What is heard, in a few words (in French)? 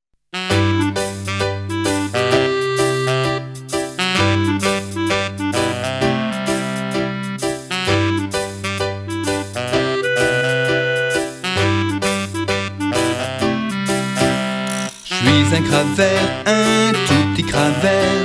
ensemble vocal variété jazz; chant du monde